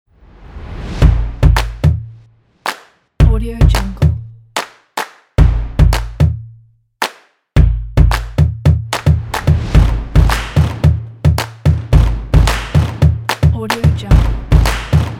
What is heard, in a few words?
stomps claps drums percussion